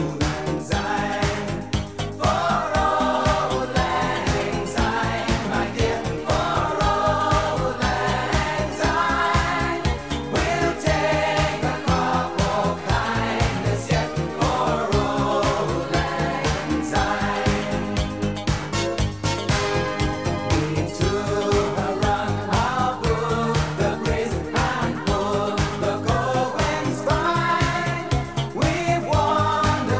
disco

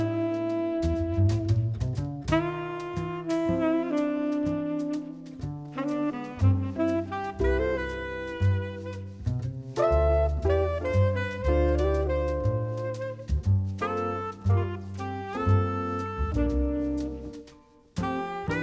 jazz

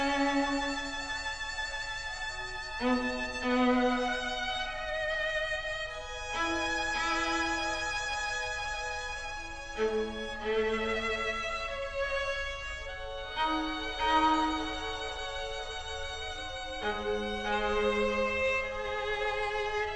classical

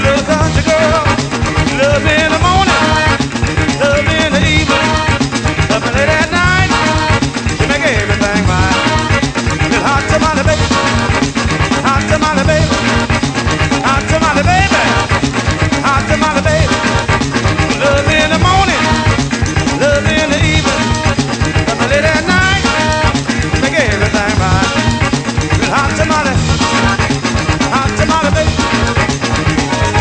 blues

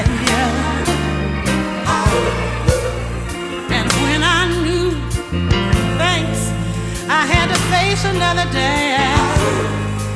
pop

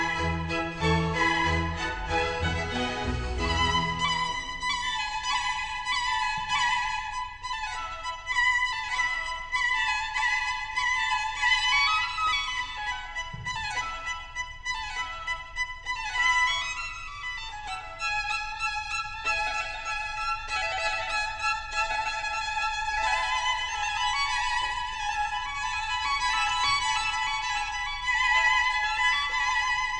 classical

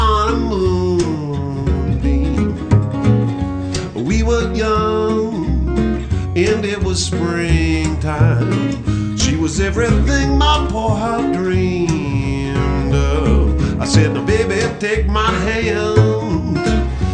blues

